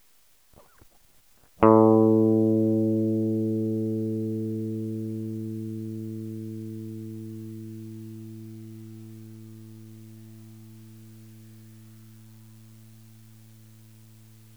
La (aire), Guitarra

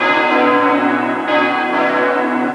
Campanas, soniditos

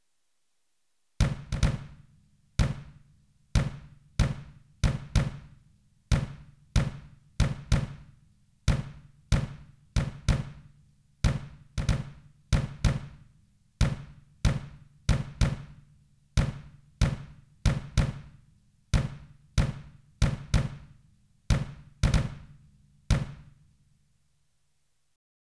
bombo

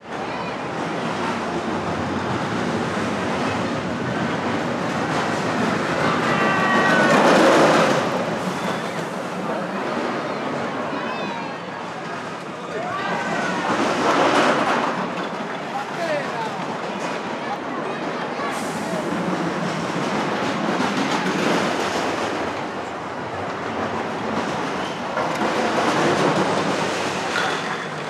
montana, montaña rusa

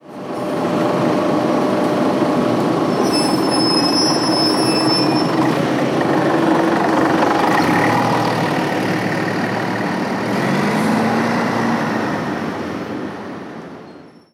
autobus sal, trafico; FUENTES DE RUIDO

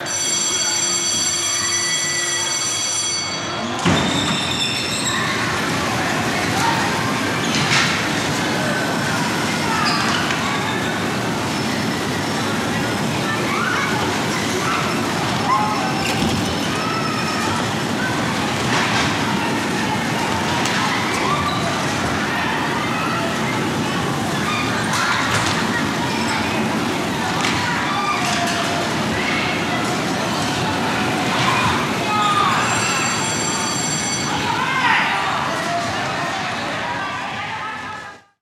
coches de choque

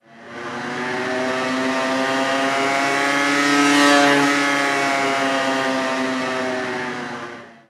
vespa, ciclomotor

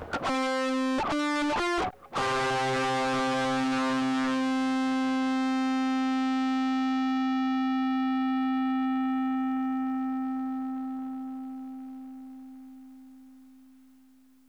distorsion, Guitarra

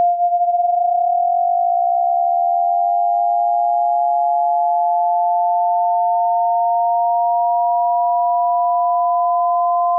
tonos de diferencia

